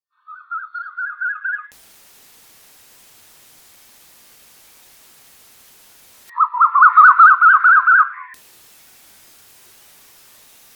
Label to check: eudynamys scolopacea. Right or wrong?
Right